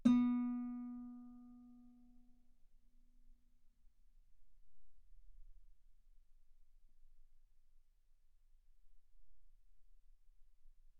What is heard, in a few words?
Guitar ff B3